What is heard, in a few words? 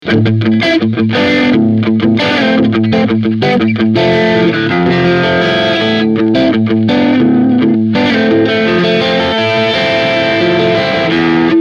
crunch middle